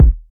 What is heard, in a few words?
short bass drum g sharp key, kickdrum sound tuned to the G# note. Loudest frequency: 96Hz